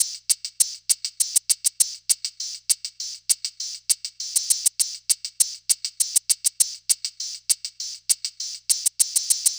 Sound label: Dark Funk Hat Shake 100; Percussion Elements